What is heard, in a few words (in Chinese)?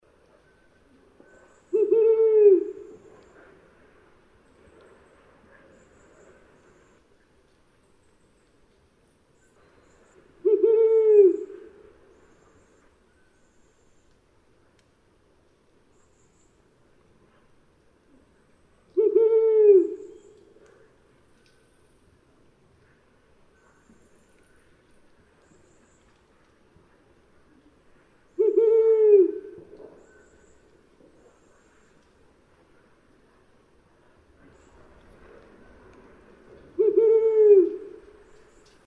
褐林鴞; 鴟鴞科/留鳥